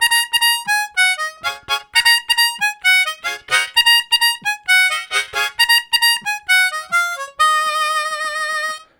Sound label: Harmonica, WAV Riffs; Zero G